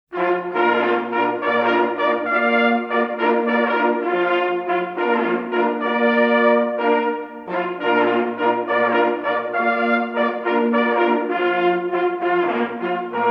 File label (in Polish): bazant na rozkladzie, sygnaly